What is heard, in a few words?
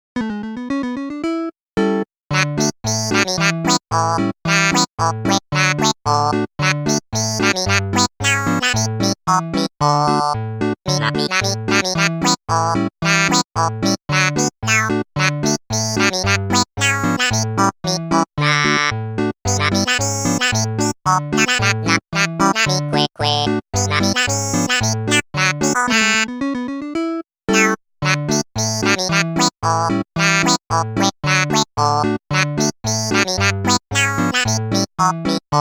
Polka; animal crossing new horizons original soundtrack 2 2022